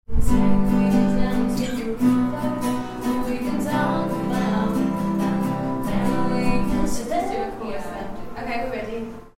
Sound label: guitar